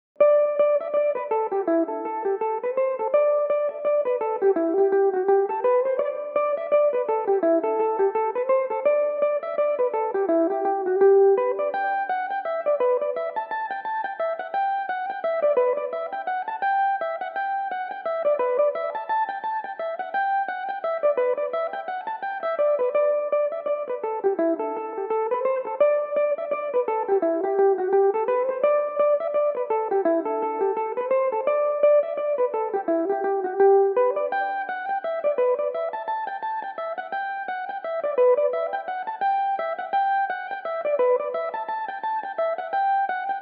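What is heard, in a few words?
G major, irish mandolin, tunes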